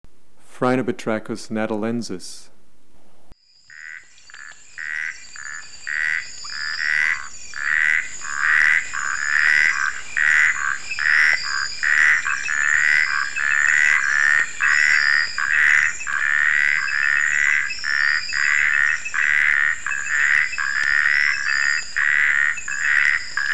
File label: Phrynobatrachus natalensis